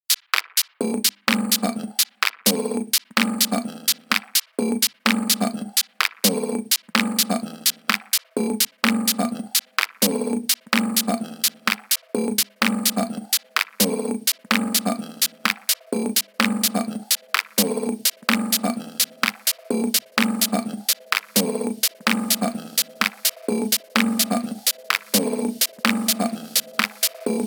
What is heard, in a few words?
Big Room Vocal Mix; Techno